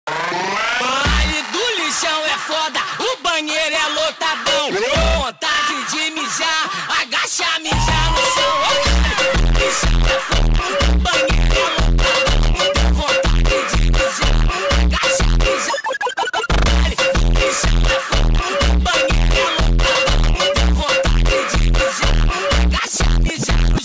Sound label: montagem beat